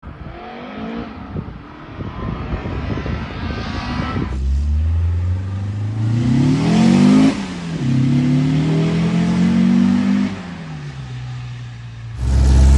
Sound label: Sound beetle type 4